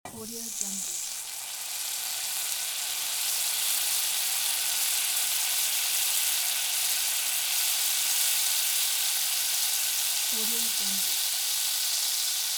butter sizzle; audio-tree

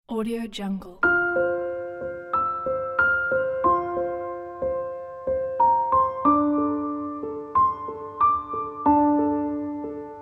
emotional background piano solo

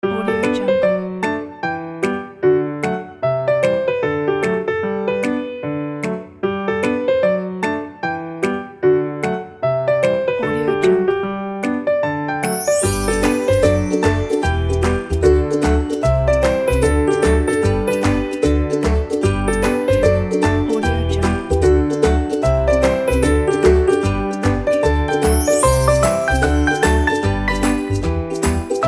fun and happy

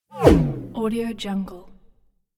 synthetic whoosh

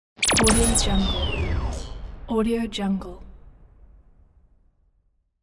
radio imaging stinger

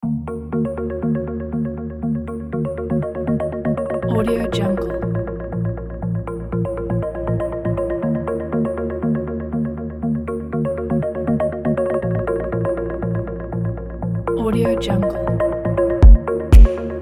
abstract melodic sequence

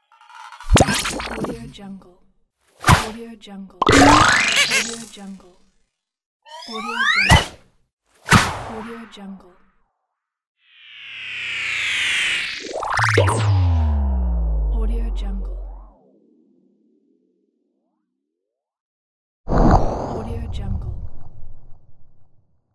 cartoon crazy sound pack

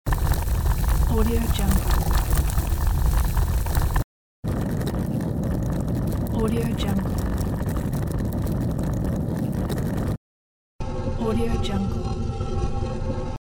roll

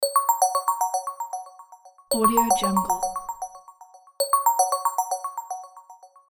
bright upbeat ringtone

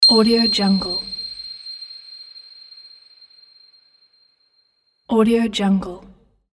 ding

